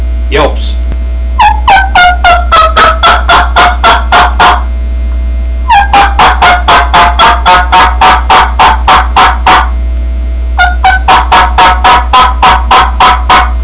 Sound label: lost hen yelps; Southland Sounds